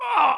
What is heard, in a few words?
armed villager damage